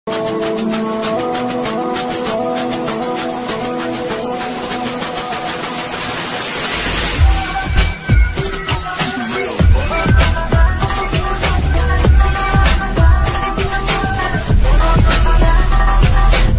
flute ringtone mobile latest ringtone sad song ringtone new ringtone